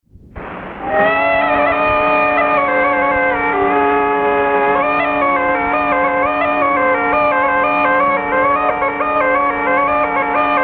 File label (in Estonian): Vene tants Kuusalu torupill folk